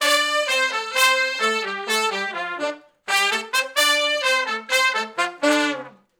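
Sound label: R&B Riff (D) unison, Horncraft; Sony Creative Software; Crimson Blue and Fabulous Horncraft 4 RnB; Horncraft for R&B; Sections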